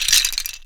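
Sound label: debris small; LEGO Star Wars Force Awakens; Junkbot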